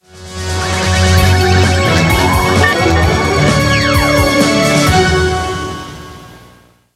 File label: Knight Meter Filled Up; knight rider